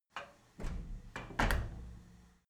cleaning machine close handle slow

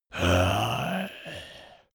zombie moan idle

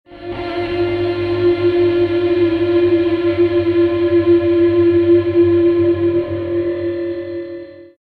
harmonic event

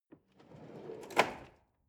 drawer large draw open lock

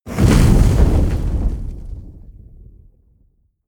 burst

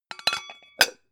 pan close hard

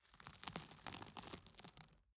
gravel drop soft

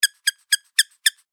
squeaking toy duck

